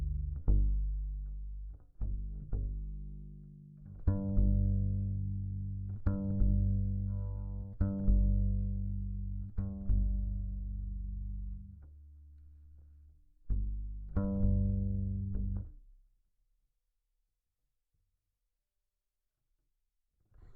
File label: Bass DI